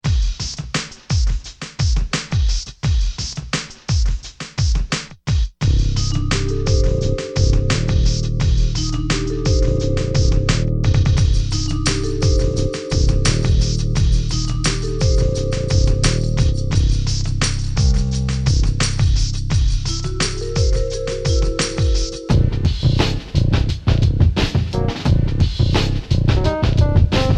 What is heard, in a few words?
Natural Gas groove